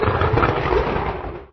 truck shift down

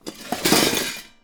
metal scrap

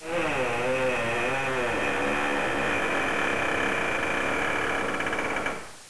door creak

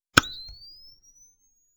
flash

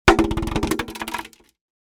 plastic container drop

